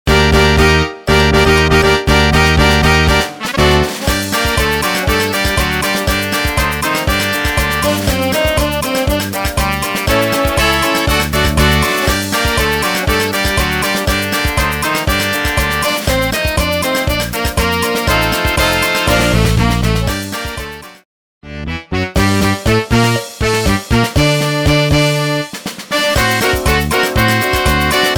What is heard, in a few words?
Samba